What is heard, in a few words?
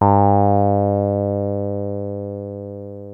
303 F#2, TB 303; Hollywood Edge; Giorgio Moroder Rare Synthesizer Collection